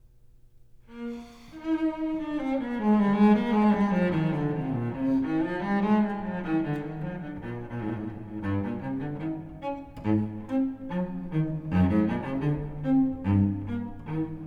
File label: Suite No.4 in E flat Major Allemande; BWV1010